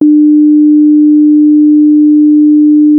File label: a mid low range frequency